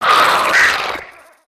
Spider Boss UP Yell; creatures mechanical

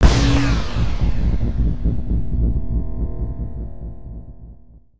Spider Boss Spread Shot; creatures mechanical

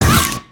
Spider Boss Strafe Fire; creatures mechanical

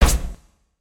hit, creatures organic

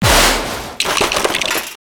Metallic; destructibles

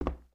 Foot Wood Boot Walk; footsteps

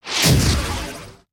Hologram Blast; Paradox; ambience nexus tower

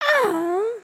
sigh; emotes

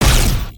laser; creatures mechanical

